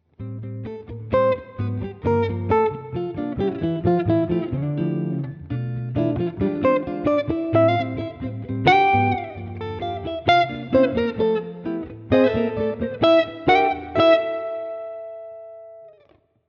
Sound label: neck position solo line octaves